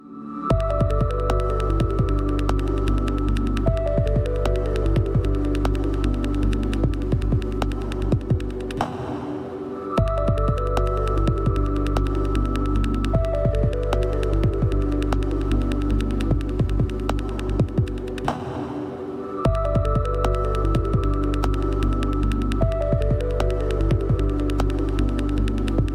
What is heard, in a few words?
Motorola Cascade Original Ringtone